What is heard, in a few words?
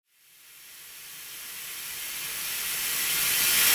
128BPM Spring Brake Kit, Future Big Room; Shockwave(PIL) - Future Big Room vol 1